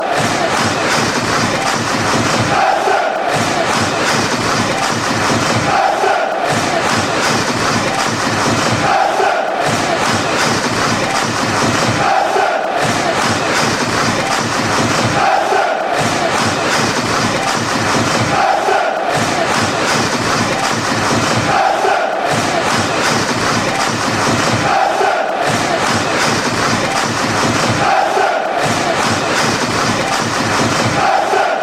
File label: claps, fanchants